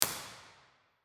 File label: IR church saint laurentius molenbeek bekkevoort belgium; saint lawrence church molenbeek wersbeek